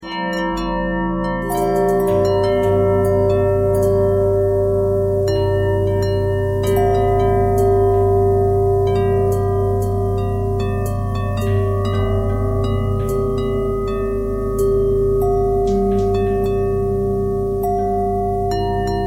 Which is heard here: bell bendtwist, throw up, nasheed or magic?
bell bendtwist